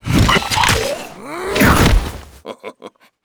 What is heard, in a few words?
finisher grab n snap